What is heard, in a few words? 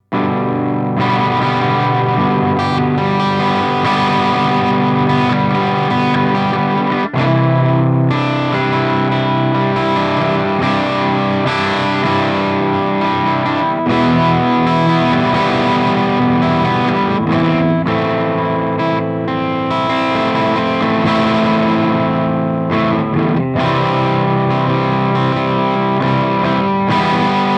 Chords